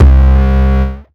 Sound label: kiss ass, Synths